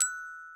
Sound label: gloken